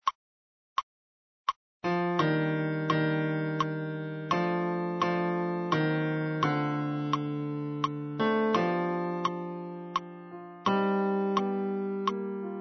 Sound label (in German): bass